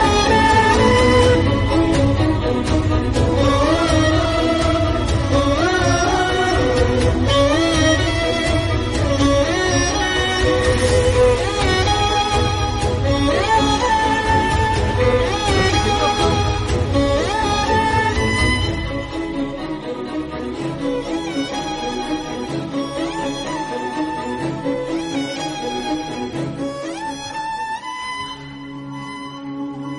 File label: sad music sad bgm; gilli movie